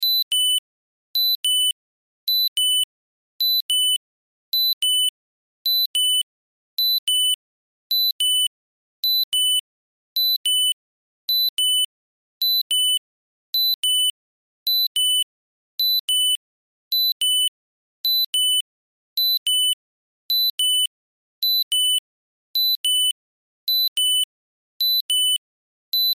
Alarm System Keypad Timer Countdown Building Security; Office; Blastwave